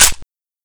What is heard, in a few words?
bolt release, weapons; hk mp5sd